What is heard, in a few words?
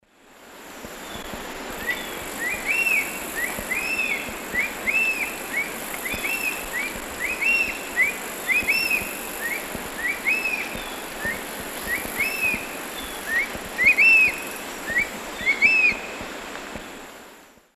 Spizaetus tyrannus, soar song; S. America; Eastern Lowlands